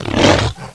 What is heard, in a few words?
Wolf Attack; Playable Tutorial Level; TR1